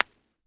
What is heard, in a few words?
coin toss; megundal